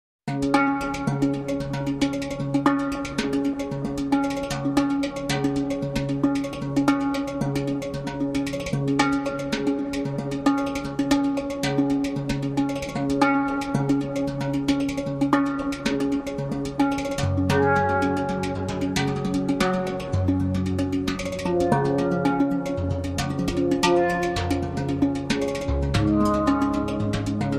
Fast Hang Drum Only

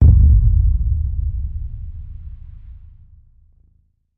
Hit Boomage

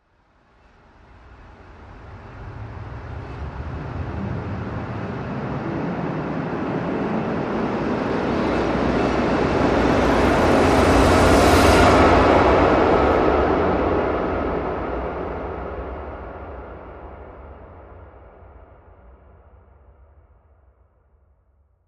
Echoes Beach Wave Rise

